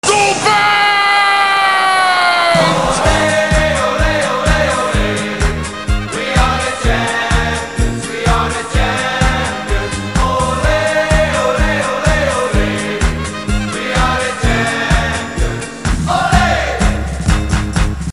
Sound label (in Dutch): Doelpunt & Olee Olee, Kermis; Kermis Spel en Kramen